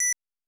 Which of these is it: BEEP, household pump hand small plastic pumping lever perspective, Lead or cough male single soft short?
BEEP